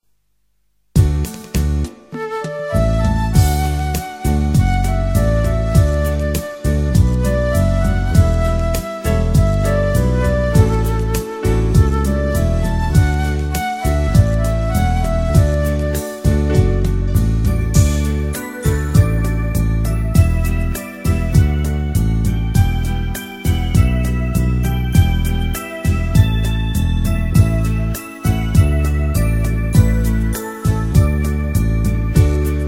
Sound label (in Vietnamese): beat